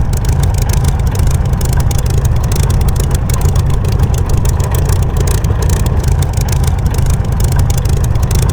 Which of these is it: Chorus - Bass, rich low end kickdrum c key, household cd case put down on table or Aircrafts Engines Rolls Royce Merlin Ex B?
Aircrafts Engines Rolls Royce Merlin Ex B